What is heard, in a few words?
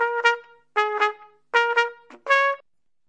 acid jazz trumpet